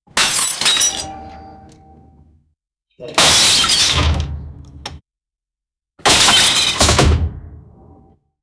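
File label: robber breaking into window, robbery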